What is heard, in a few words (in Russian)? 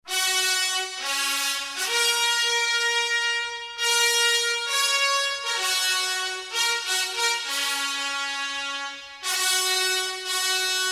fanfary